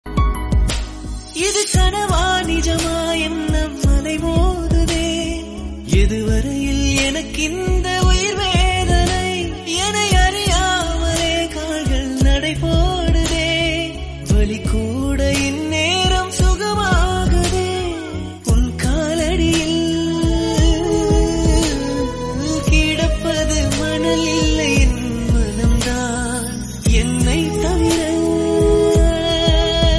tamil

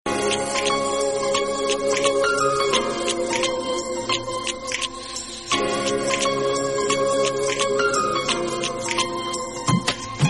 harmonium music cover

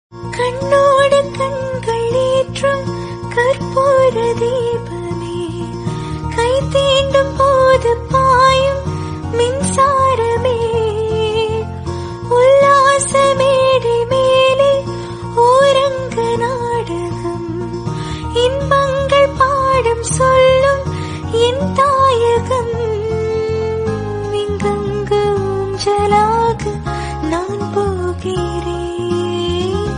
female version

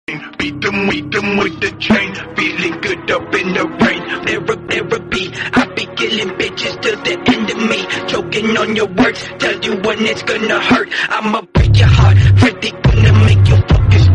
theme song bgm; aew

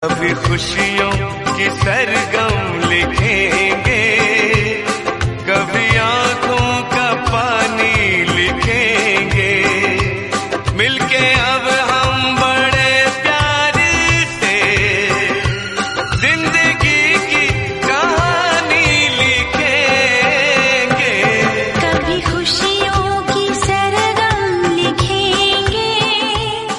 romantic hindi

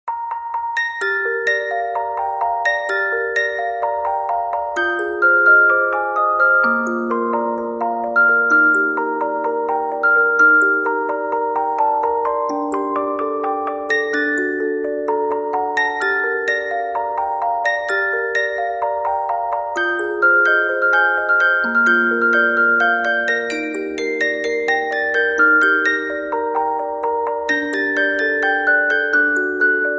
music box; jujutsu kaisen

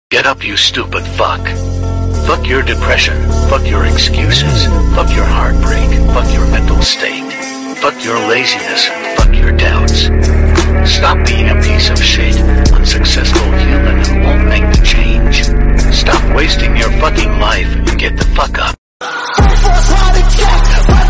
emote english song

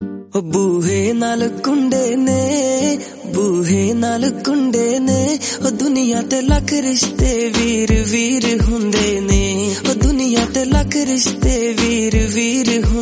bgm

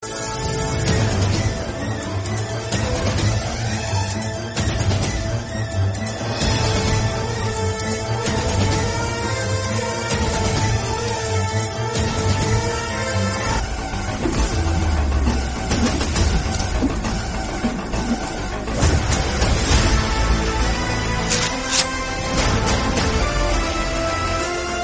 theme bgm kannada; roberrt

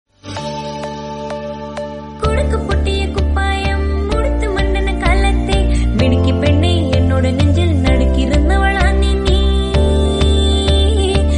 romantic mashup instrumental flute

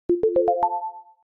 school bus horn sound notification

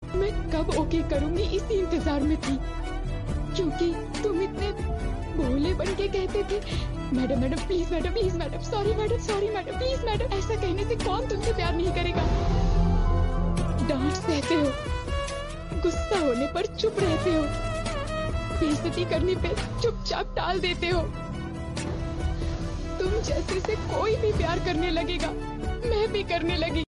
dj remix; rashmika mandanna

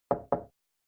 samsung notification; latch

